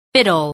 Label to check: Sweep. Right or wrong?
Wrong